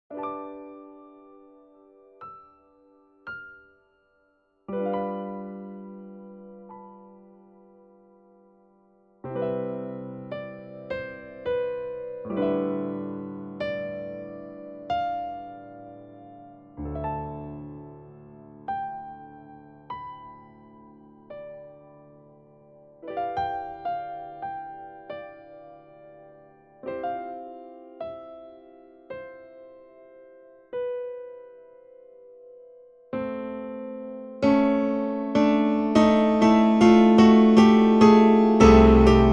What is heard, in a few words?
never falling rain piano